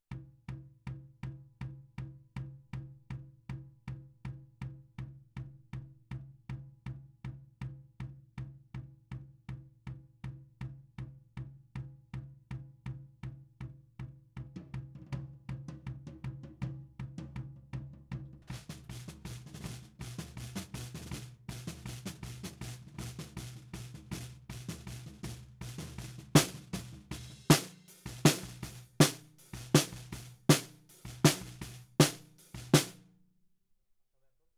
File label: Snare Bottom, 160 BPM